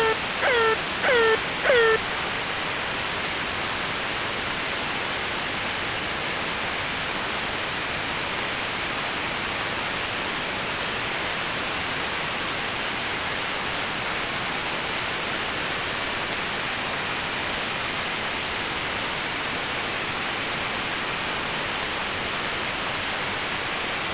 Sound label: airhorn